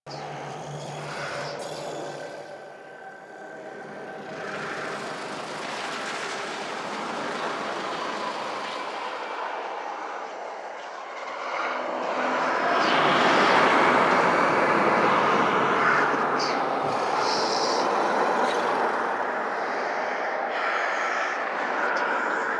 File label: Build Up Loop; gameplay; Warframe; NineteenNinetyNine; Stage11FuturePast